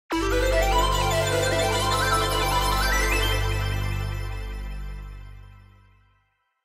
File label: jingle